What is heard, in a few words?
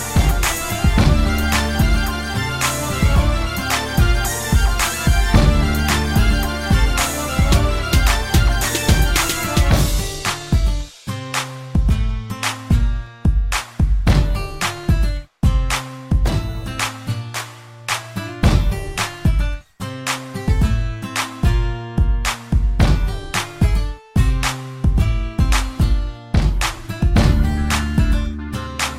Acoustic Hip Hop Mel